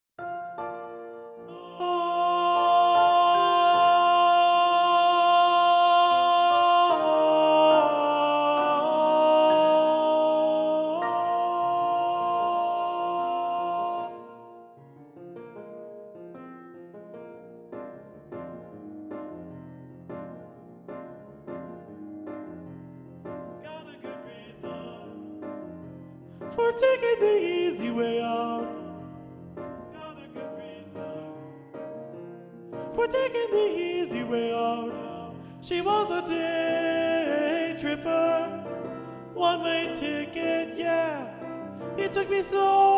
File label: satb alto predominant; Altos